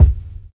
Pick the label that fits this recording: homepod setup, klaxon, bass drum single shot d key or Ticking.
bass drum single shot d key